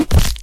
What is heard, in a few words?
body impact